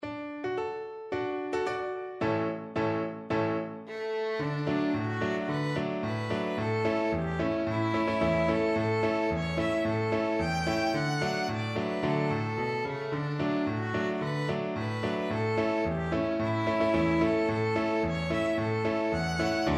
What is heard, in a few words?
tiroler holzhackerbuam violin